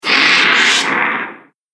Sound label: Dragging The Demon, monster; Horror Sound Effect